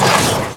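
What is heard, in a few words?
suspension, car; Cars; XBOX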